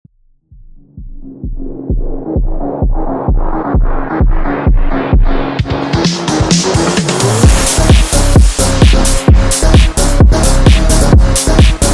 Phonk

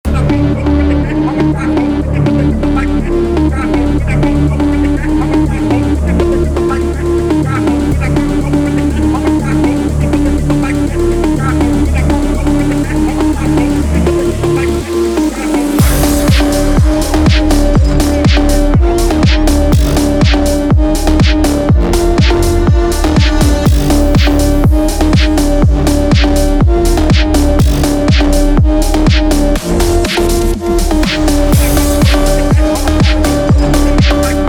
Phonk

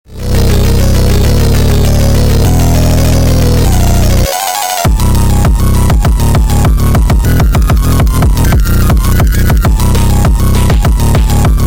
Phonk, Drift Phonk

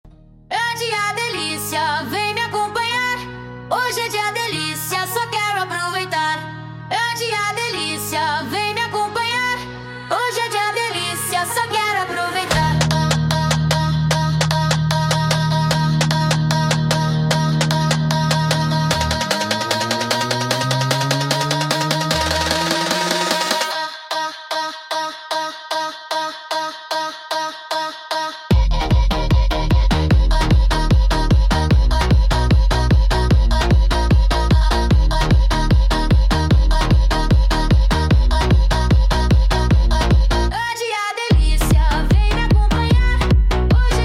brazil Phonk

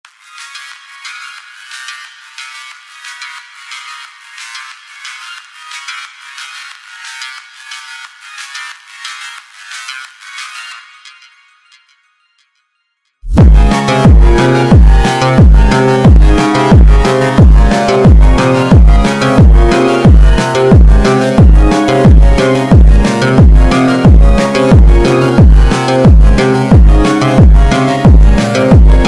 Phonk Funk

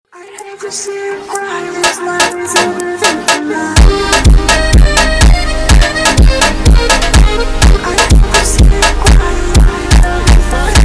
Funk ultra Slowed

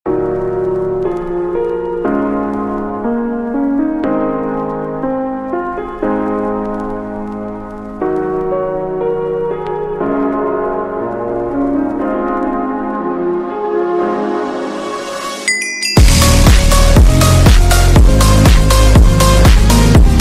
PHONK; MINECRAFT; ringtone

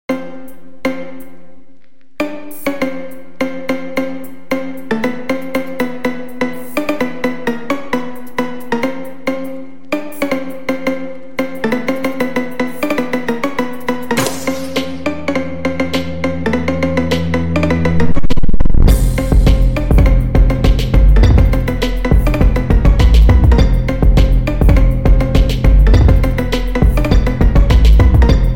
Speed Phonk Phonk House remix

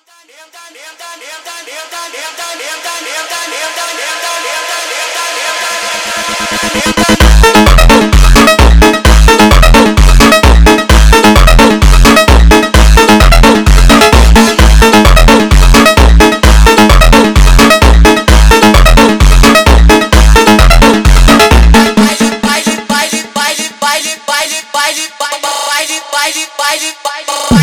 MONTAGEM

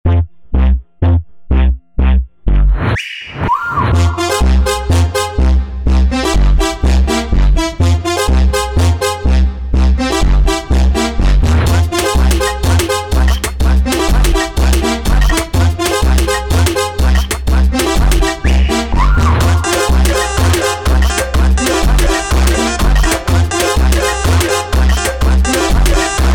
Accordion Funk

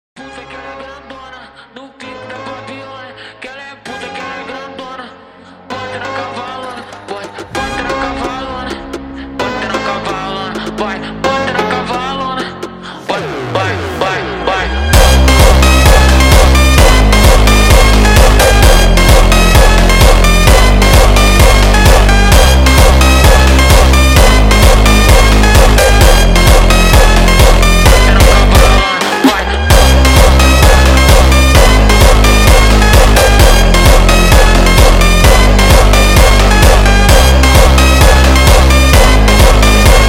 Funk Jumpstyle

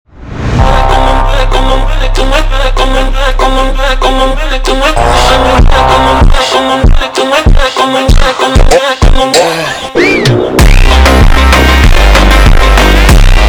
Funk super Slowed

